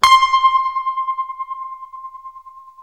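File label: tremolo C 5, guitar; East West; Steve Stevens Guitar Samples Collection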